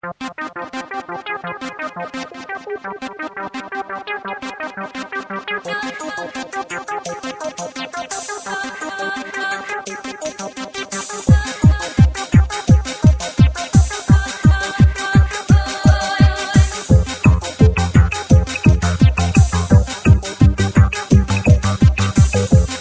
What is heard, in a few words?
Teckno Electric; meloboom